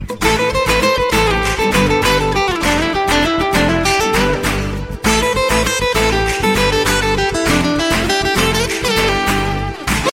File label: huawei tune ringtone